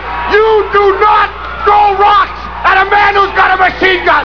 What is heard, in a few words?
piper